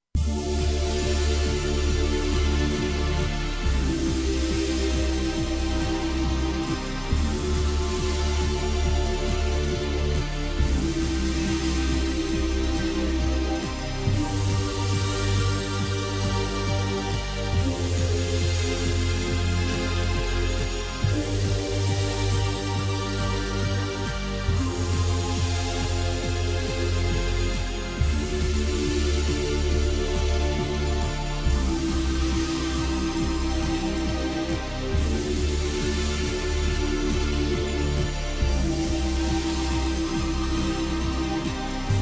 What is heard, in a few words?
Strange Game Menu